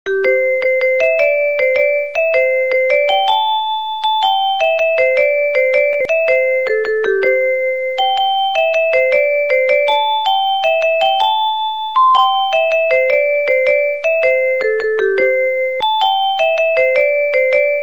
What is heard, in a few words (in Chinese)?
音乐铃声